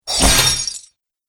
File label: Morningstar Hits Chainmail; Battle Sound Effects